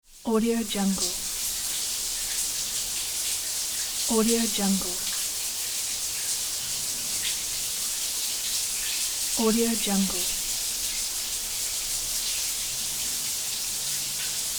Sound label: shower